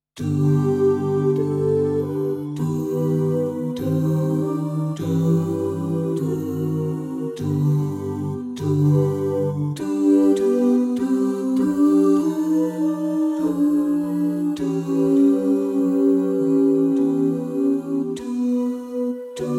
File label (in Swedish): Tenor; Pingst